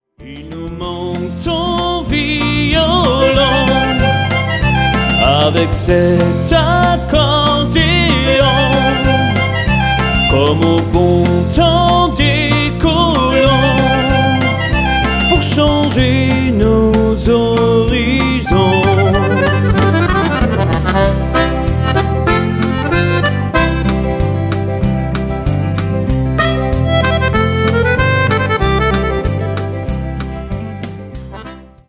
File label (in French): extrait ton violon; country